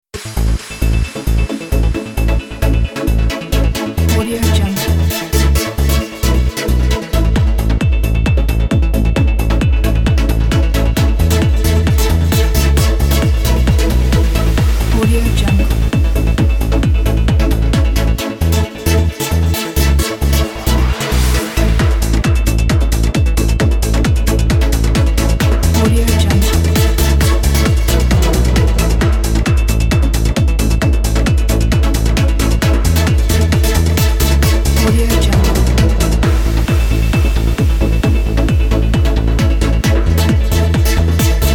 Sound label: dynamical positive